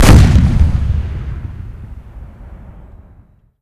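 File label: weap ac130 105mm distant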